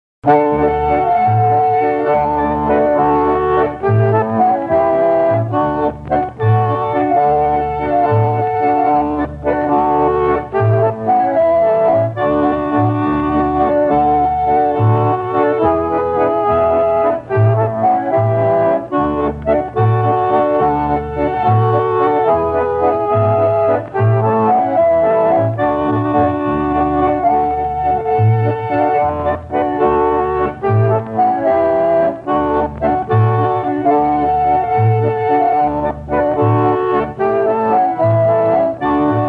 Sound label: Pond Lily Waltz, concertina